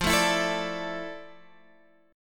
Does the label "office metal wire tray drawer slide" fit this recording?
No